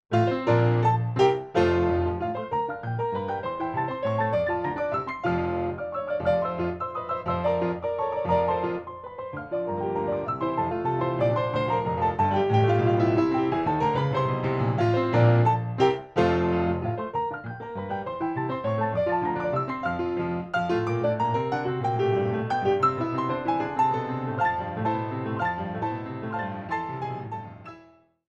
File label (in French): Valse; Marie Jael